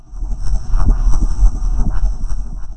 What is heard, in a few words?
Displacer Fire, Science Fiction Sci-Fi Electronic Alien Power Source